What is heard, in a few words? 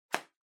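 Sensu Close, folding fan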